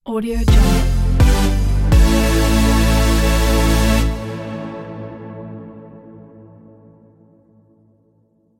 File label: euphoric achievement logo